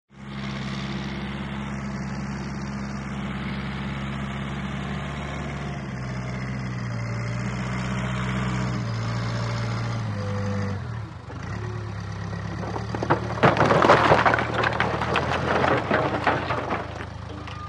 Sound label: 750 Dozer Beeps, john deere 750